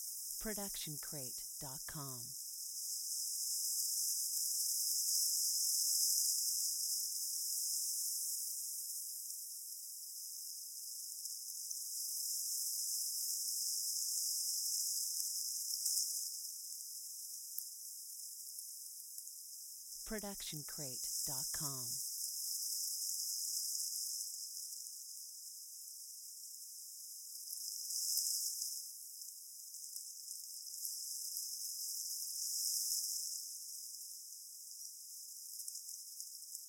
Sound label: Night Time Insect Swarm Noise; SoundsCrate